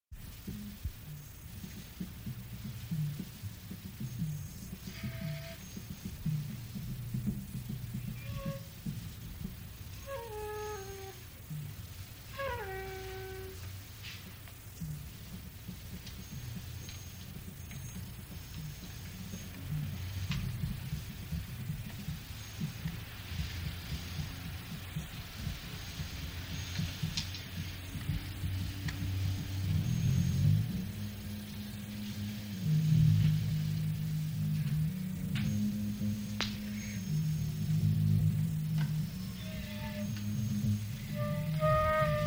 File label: Flute Jam